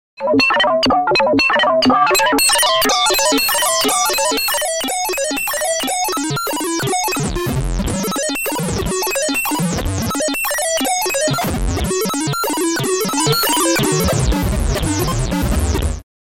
Fast Sequence On Synth Changes & Distorts Reverberant; Electronic Sci-fi